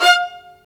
STR, Vas Marcato; STR Vas3 Marcato

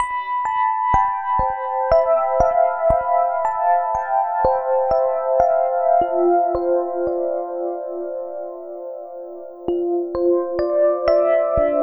FM Keys; Sonic Foundry (Sony Creative Software); Slow Silhouette

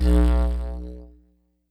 Digeridoo short; YAMAHA RM1X

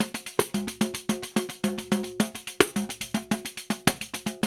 RIO, Rio Batucada; Spectrasonics; Supreme Beats; World Dance

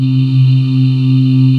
VOICES C3, Choir; USB Soundscan vol.28 - Choir Acoustic & Synth; AKAI

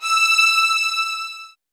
E, soft violins; Q Up Arts; Denny Jaeger Master Violin Library

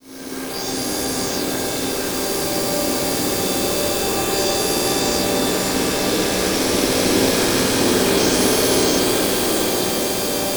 Textured Swirl, Atmospheres; Zero-G Ambiosis

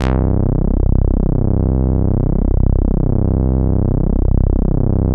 MOOG TAURUS C1; Big Fish Audio - First Call Vintage Keyboards

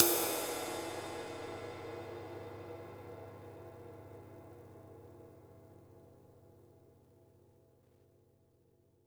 flat ride; JazzFunkKit

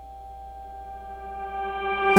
FARFISA ORGAN; Sonic Reality; Vintage Timetraveler

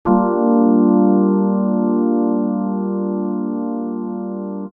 Digi Pads; Jungle Frenzy 1

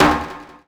One Shot Perc, Drum N Bass - Percussion; Sonic Foundry (Sony Creative Software); Knutrix Drum & Bass

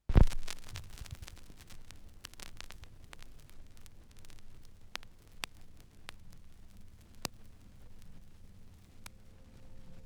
Turntablism; Sonokinetic - The Roots Drumloops

